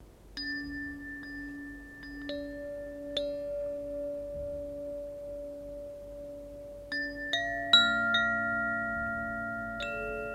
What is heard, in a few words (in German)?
klangspiel koshi aqua